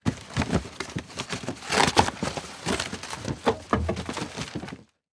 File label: Boxes Grab And Tear Open; Foley